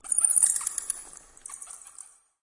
bats; Be Cool Scooby-Doo! - It's Dark Out There; ThrillGames

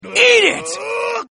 win; leo

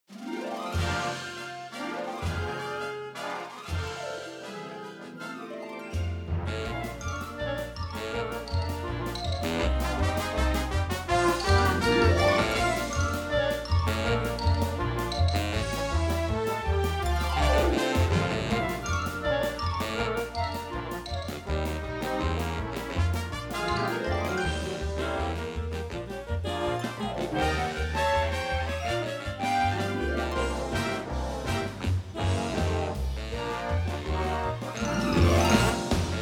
music menu intro; Teen Titans Go! - Raven's Rainbow Dreams

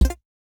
ui down; Steven Universe - Beach City Drifters

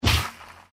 soil and leaves; Mr. Bean - Bean Skidding

